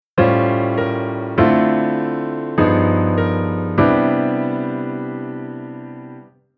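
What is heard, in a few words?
Chord Tension Secondary Dominant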